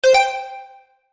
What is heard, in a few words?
Chime Musical, Chime Musical Multimedia